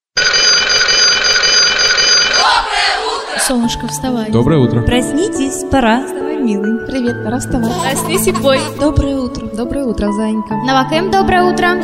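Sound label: kind alarm clock; dobryy-budilnik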